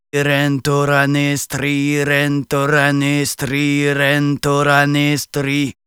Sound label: Battle Cry, Viking Vocal, Un-Processed; Viking Vocal Builder; Battle Cries & Phrases